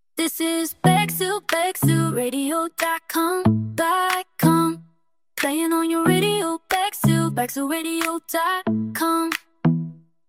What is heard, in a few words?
Fem Singer, jingles; Bexhill Radio